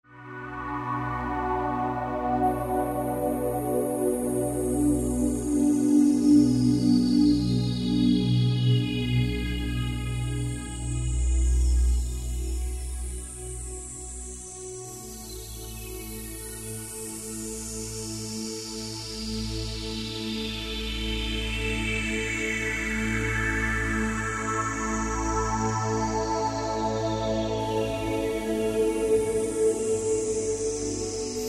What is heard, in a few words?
Dawn Sweep, Roland JD-990; Factory Patches